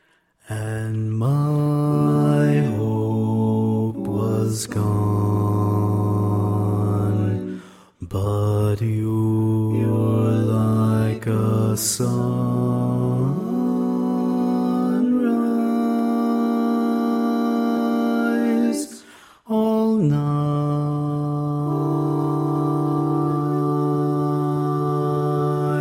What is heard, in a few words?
Bass, barbershop